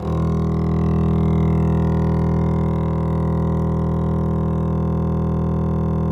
DBL BASS F#1, Synclavier Strings; ILIO

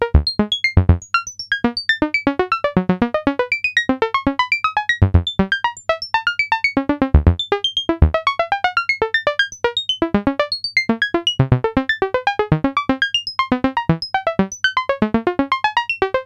RANDOM SEQ; TECHNO-BOX

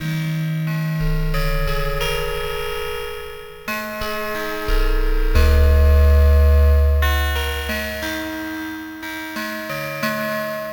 Melody, IDM

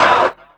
fx vox; E Lab; XTc Files of House

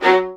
LDVLN1 ATT F, LOUD VIOLINS; Q Up Arts; Denny Jaeger Master Violin Library

